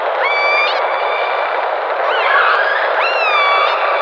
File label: killerwhale